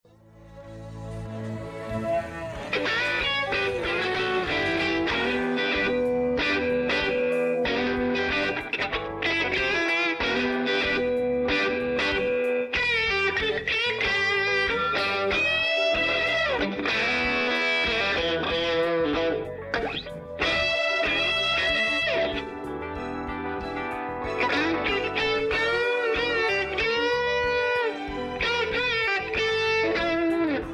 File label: dry guitar solo